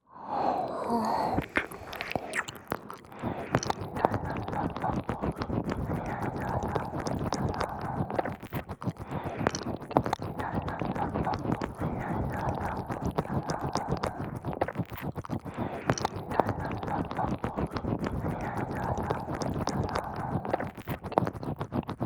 ゾリゾリ耳舐め

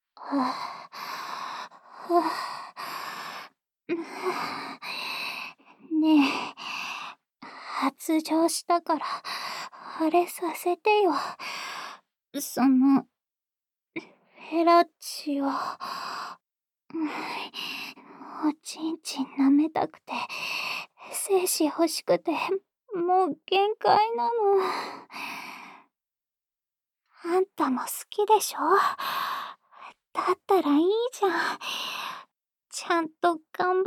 フェラチオループ; オナサポ用

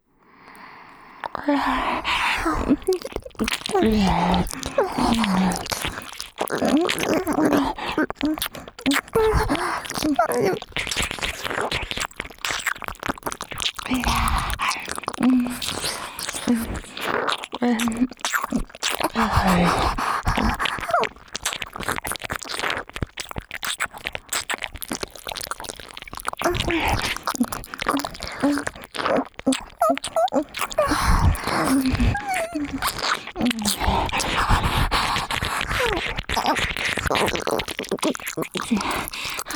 耳奥W舐め犯しおまんこサンドイッチ3P, ASMR

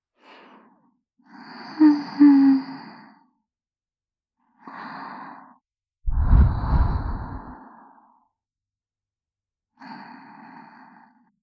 寝息, ループ; 瀬里奈の寝息(右)

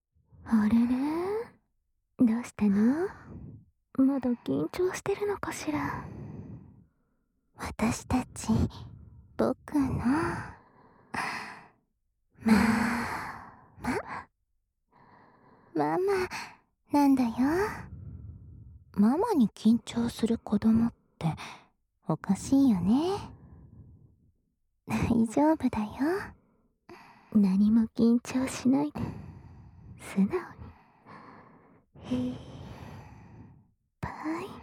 ダブルベロチューで甘やかし

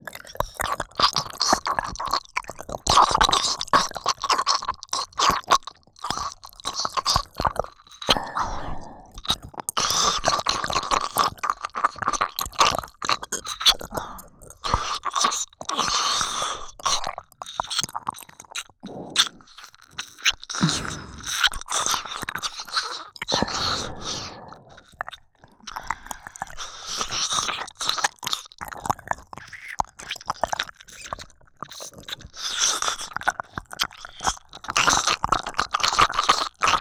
二人で耳舐め

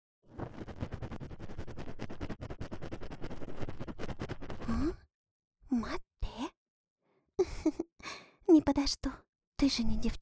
【右】高速綿棒, ASMR

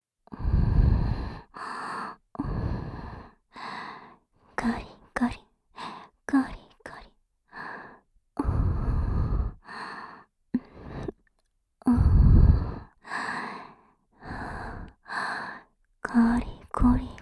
かりかり・こりこり地獄ループトラック, ASMR; ドスケベ添い寝

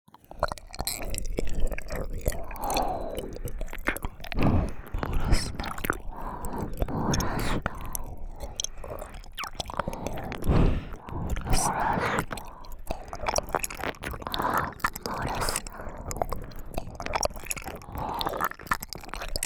耳舐め囁き 漏らすな

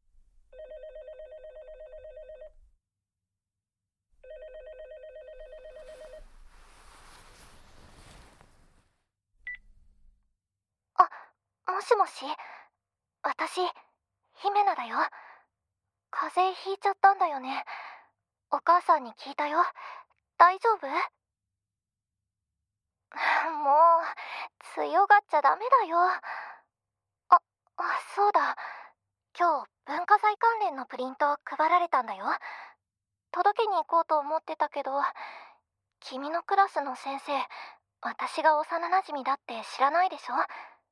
ねっとり耳舐め; 突然押しかけてきたここみに捕まりねっとり耳舐め手コキ

